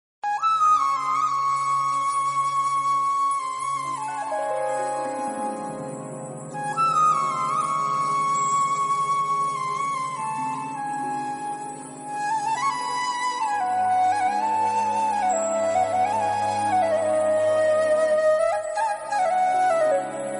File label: Instrumental Flute Bgm; Ponniyin Selvan